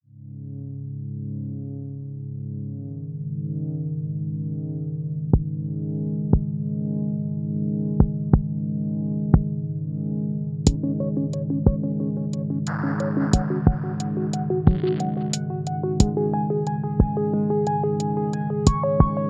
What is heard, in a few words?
subtle tape